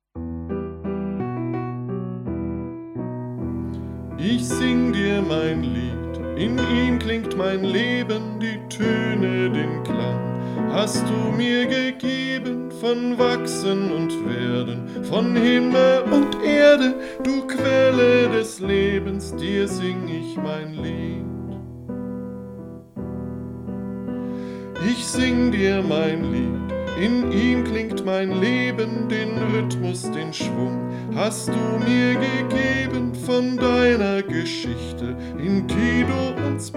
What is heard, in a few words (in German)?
Melodie; patmos-gemeinde